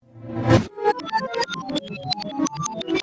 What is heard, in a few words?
playing fast reversed; gamecube